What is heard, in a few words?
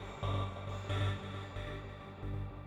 SYNTH PAD, INDUSTRIAL; Sphere